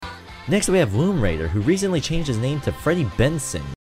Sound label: applause; osu; Zen's Multi V1